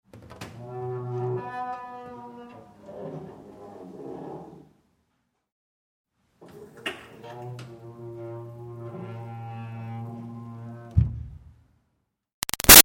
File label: Door Wood Push Bathroom Groan O-C Slow; Miscellaneous Doors (Sound Effects:Doors); Big Room Sound